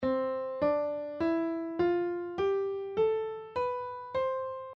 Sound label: c maj scale